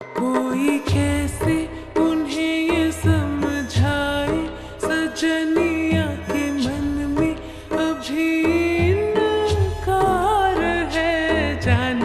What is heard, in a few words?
Slowed And Reverbed